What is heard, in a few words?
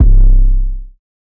808 C; Cymatics; Oracle Sample Pack; 808s